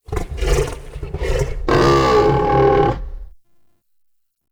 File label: Stag Vocal Pain Idle; Animals; Vocals